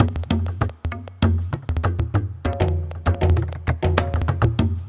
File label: Tablatron, Processed Percussion